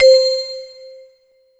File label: Kawai K5000W Celesta C5